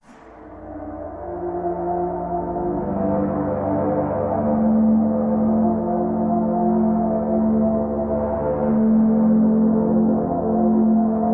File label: Music Pad